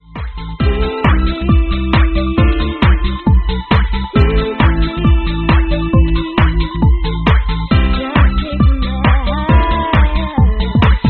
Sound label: Organ Mix